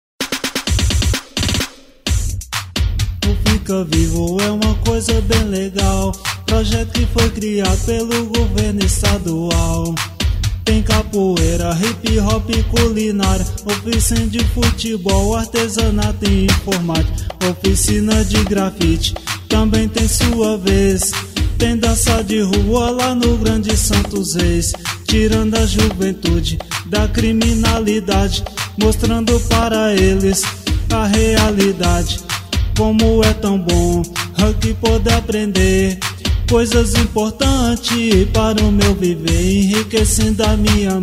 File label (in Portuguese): funk